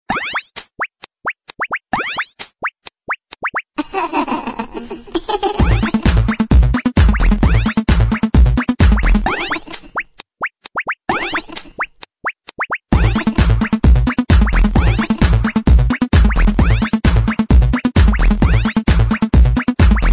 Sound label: Motorola Buble